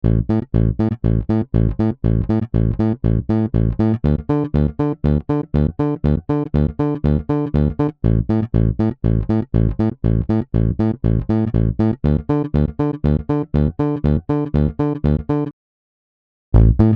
british bass

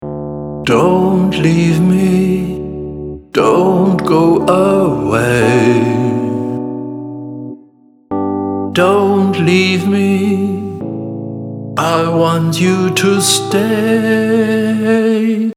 melodyne vib

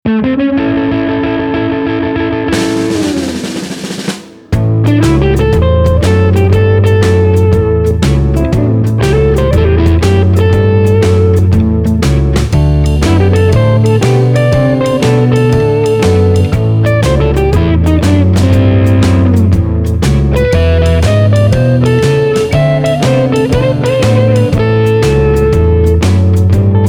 blues

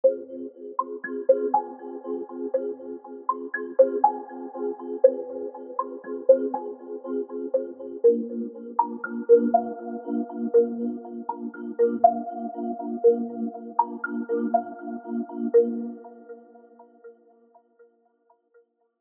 Arp 8th Flux Morph

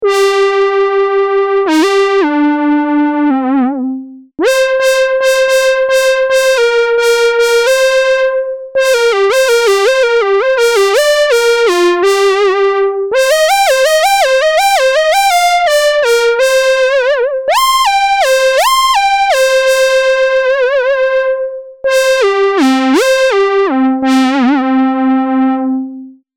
Monark Solo

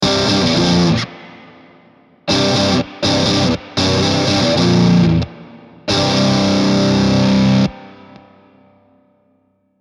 riff double metal; IK Multimedia AmpliTube Orange; rockerverb50; OR50; AmpliTube-Software

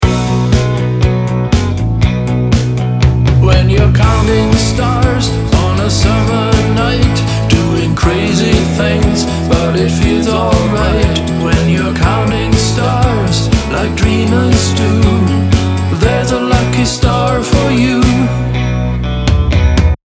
Counting TR5 Really Pushed

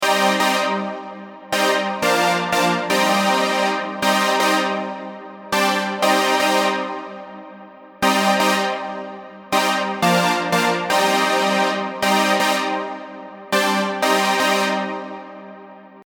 hypersaw chords

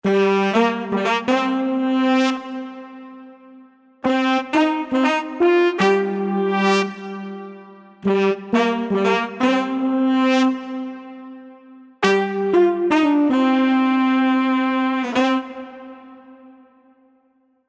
soft n mellow

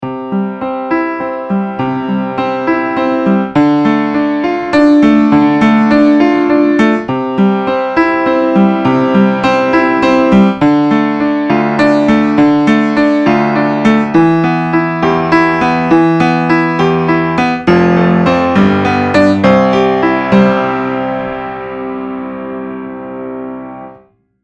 pure upright closed

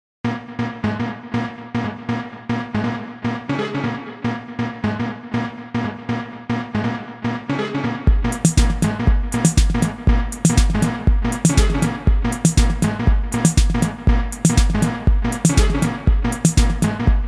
techno

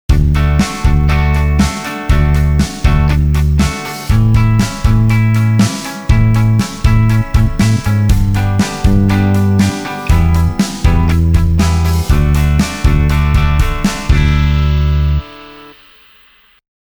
Gtrs; Les-Paul-Modell; Session Guitarist Electric Sunburst

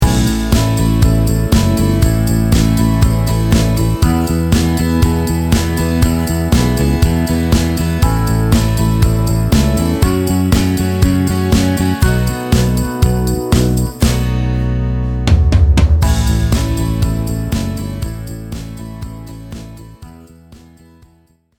playback str Ac

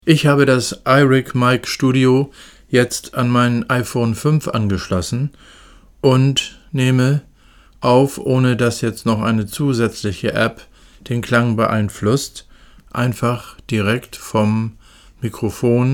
Phone

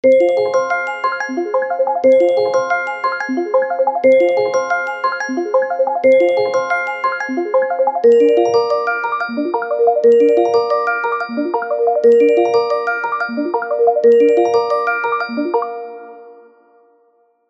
Arp Triplets 16th; Flux

